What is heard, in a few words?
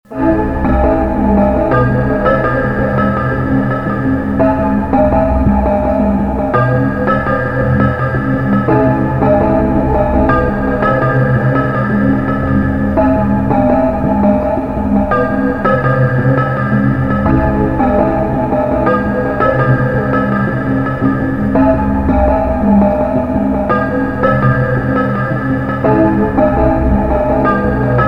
Slowbell